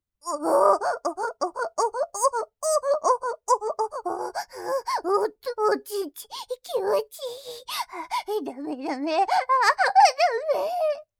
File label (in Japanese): おほぉっ！♥おっ！♥おっ！♥おっ！♥